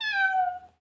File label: cat calm